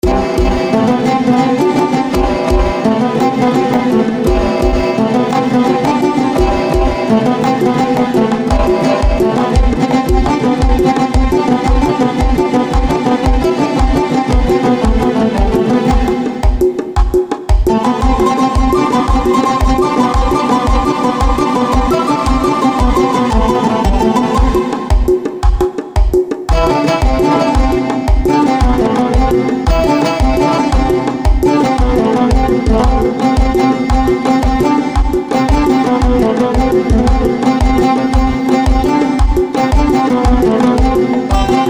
Arabic Sounds, Generalmusic Equinox; MYFLOW

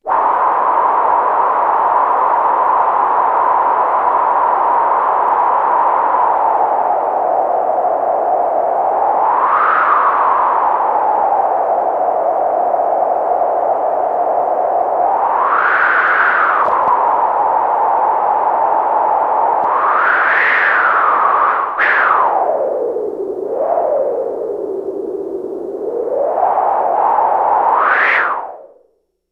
Wind, Roland SH-2000

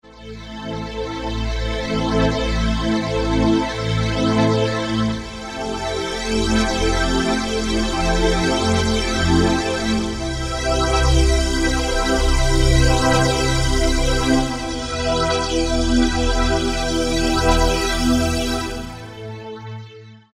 Abyss, Yamaha TG500

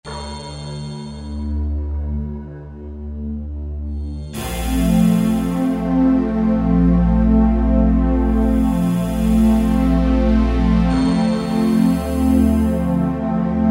Pinger, Korg Wavestation A-D

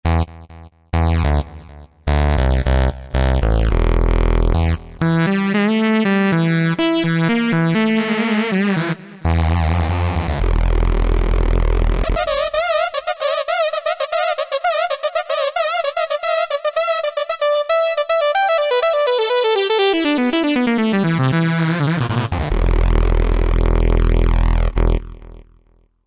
Dirty Lead; Roland SR-JV80-09 Session

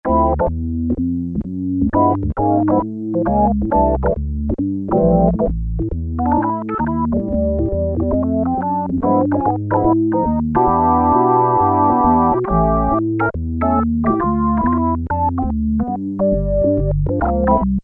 Organ, Roland Juno-106; Factory Preset

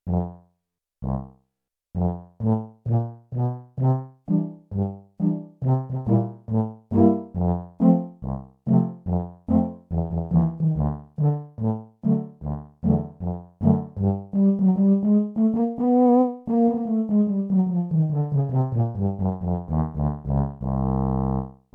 Tuba; Tuba + Horn 1

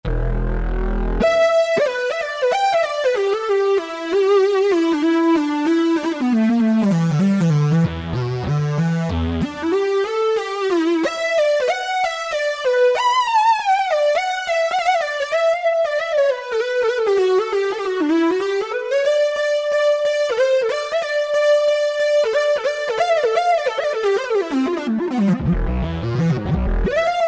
Porta Lead; Factory Preset examples

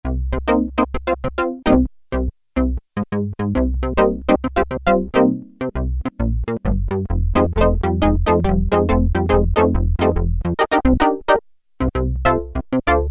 Funky II, Roland Juno-106; Factory Preset

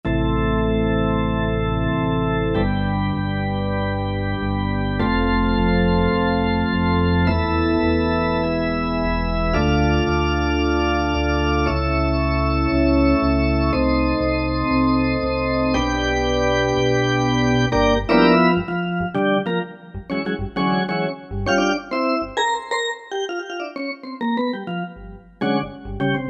E. Organ, Roland U-220; Factory Patches

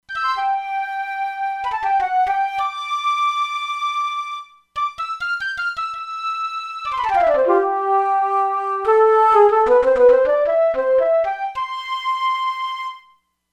Alto Flute, E-mu Proteus-2; Factory Presets

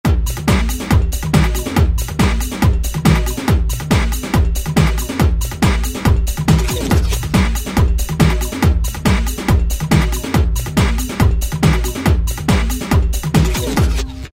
Minimal, Korg ES-1; Factory Patterns

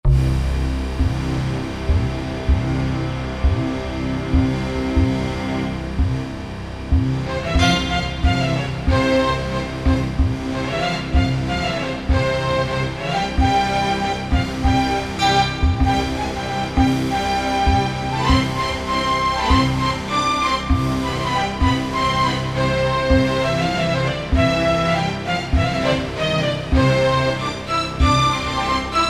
Indian Orch, Korg N364; Factory Combi demos

